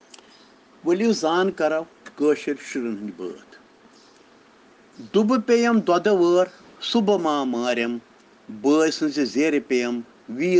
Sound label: Children Rhyms in Kashmiri